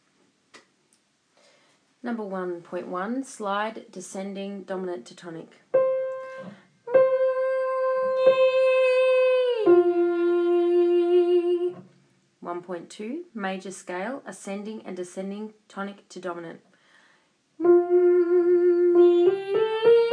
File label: Grade 1 technical exercises